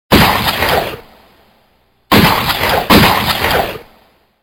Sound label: gunshots